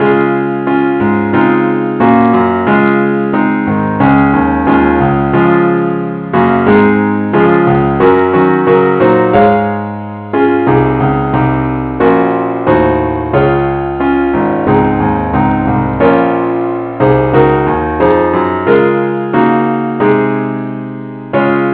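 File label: keyboard only key D flat; christe Sanctorum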